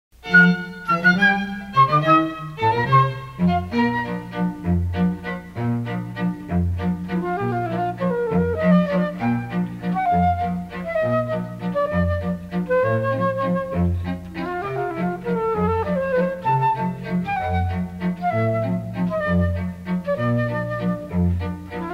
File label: Waltz, chamber music